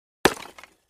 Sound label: Clay Chimney Wall Hit, concrete & brick